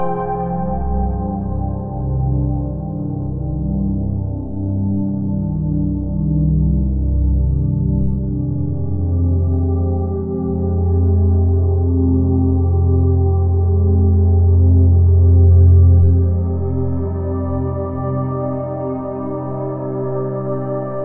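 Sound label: Origins Pad Loop; Type:Rider; PS Vita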